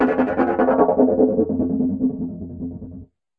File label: 151 hammered filter down, drum loops and breaks; 141 to 160 bpm